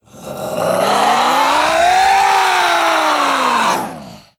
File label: chase; slender